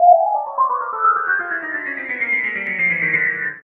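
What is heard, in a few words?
PIANO loop; East West - Phat & Phunky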